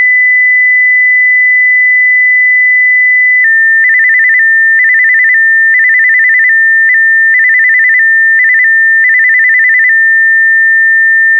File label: imts call